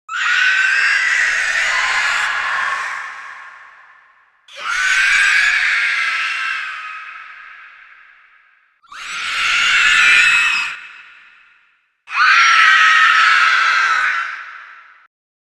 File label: the nazgul scream